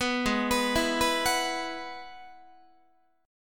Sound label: Ab Minor 7th Sharp 5th Ab, down, Guitar, 4A, 4E, 5A, 5D, 5G sharp, 6C sharp; arpeggiated; JGuitar